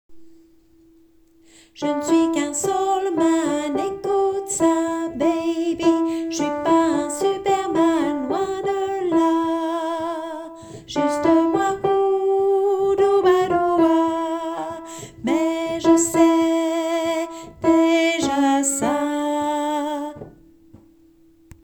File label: alto